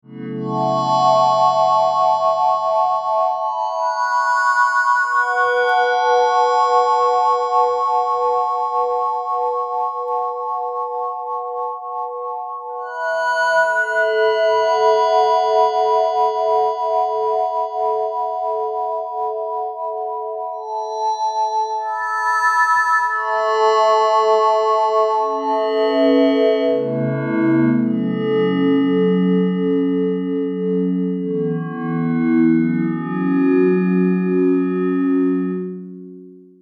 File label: Bell Clean; Music Mouse